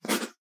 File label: pen write; soundscrate